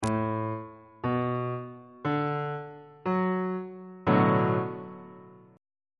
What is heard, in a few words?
b minor 7th 3rd inversion on bass clef